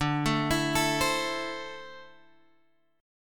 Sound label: D 7th Suspended 2nd down Guitar Open G